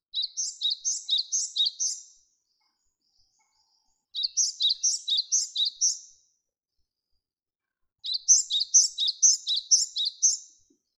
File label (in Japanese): shijuukara, birds